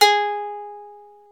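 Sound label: ethnic plucks; Eth Plucks, Pluck Duo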